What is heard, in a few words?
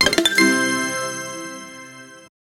punchy news jingle; punchy news jingle with